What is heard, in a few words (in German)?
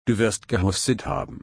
husten